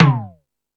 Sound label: Hip House, drum machine